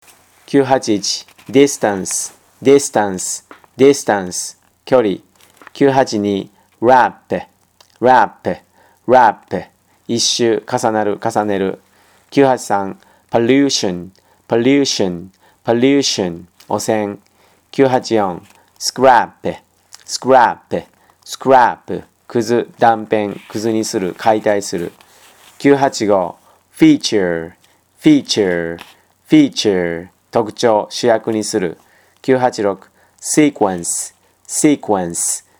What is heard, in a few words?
tango